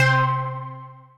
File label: Wide pluck, Synth Keys; Wavetable; Ableton; Synth Essentials